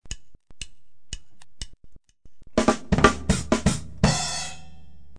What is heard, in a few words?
break